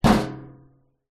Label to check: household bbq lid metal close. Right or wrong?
Right